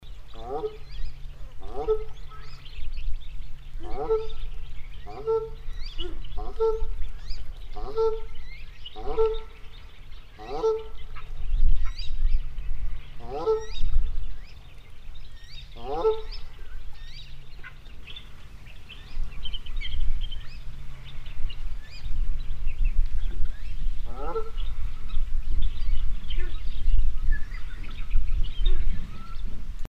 tadorne a tete grise, chant

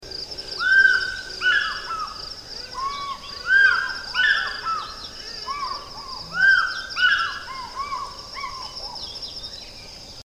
pygargue vocifere, chant

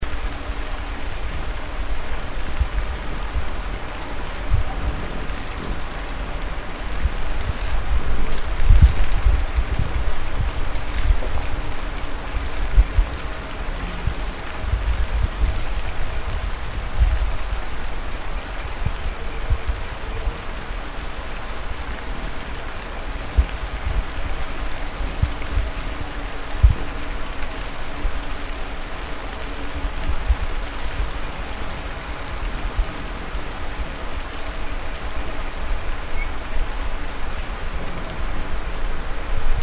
ariane a ventre roux, chant

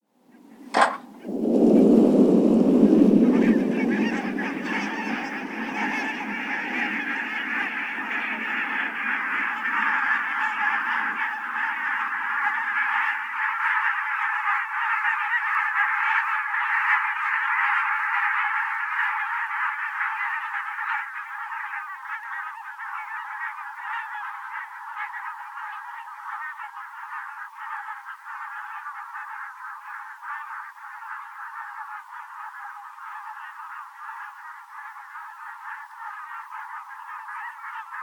bernache nonnette, chant

